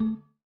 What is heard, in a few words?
Windows Default; Glass